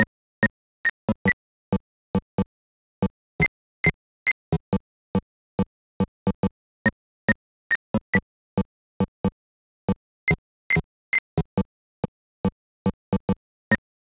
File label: SineWave